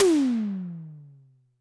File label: TD-20 Tom A, Roland TD-20 Toms